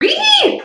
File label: reee